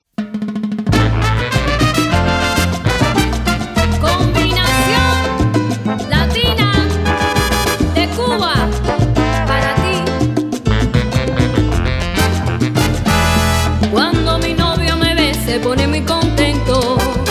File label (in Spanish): Salsa